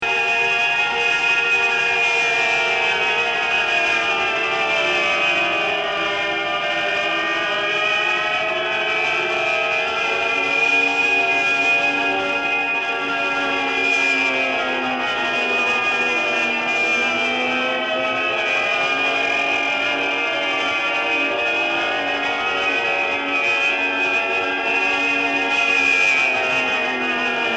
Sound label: Air Raid Gtr